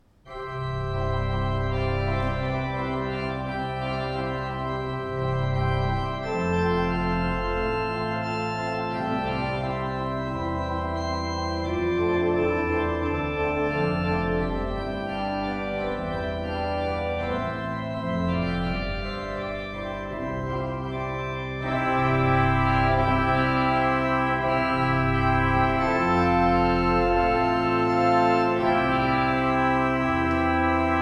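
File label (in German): Kemper Mayer, pfeifenorgeln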